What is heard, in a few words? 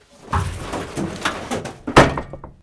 Vehicle Hatches; Red Orchestra 41-45